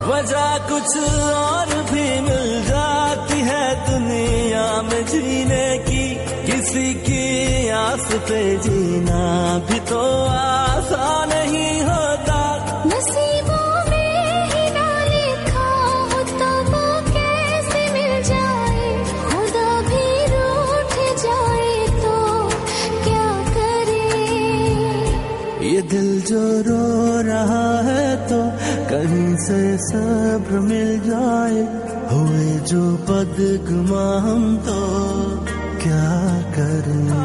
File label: Hindi Sad Ringtone